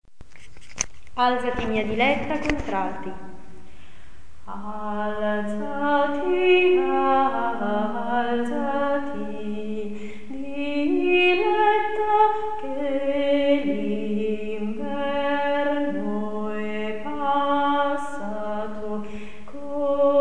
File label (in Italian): alzati contralti